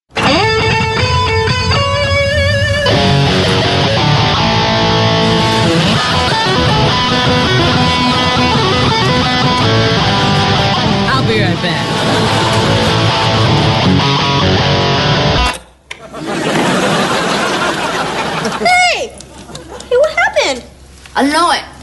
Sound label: guitar